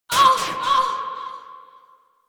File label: Player Hit Death; Warframe; Mini Games; Caliber Chicks Minigame